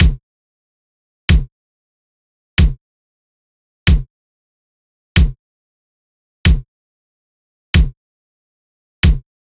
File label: Drum Loop - 93 BPM Kick, Latin; Loop Stems; Cymatics; PARADOX II - Drum Loop Collection; Jammed